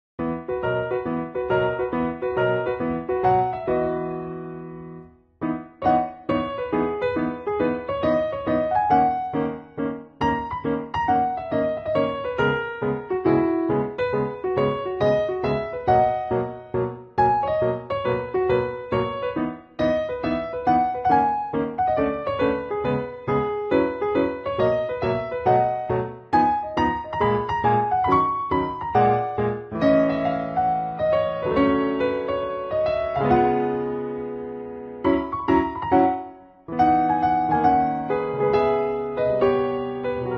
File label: piano ver.